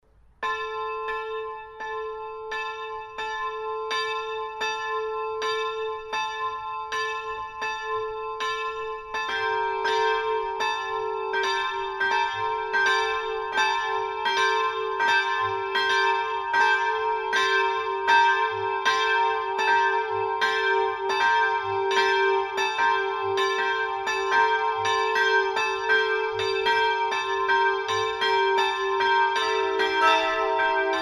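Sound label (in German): Tonaufnahme e2 g2 a2 Plenum, mehrstimmige Geläute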